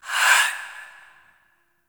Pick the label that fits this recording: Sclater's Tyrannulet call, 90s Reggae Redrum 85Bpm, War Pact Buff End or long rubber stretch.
War Pact Buff End